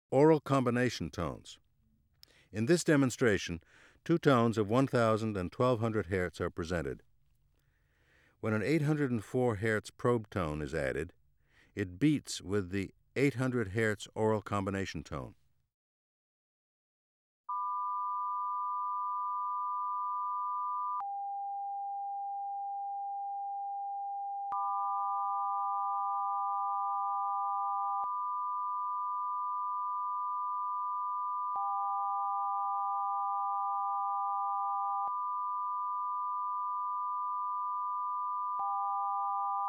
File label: Aural combination tones, Auditory Demonstrations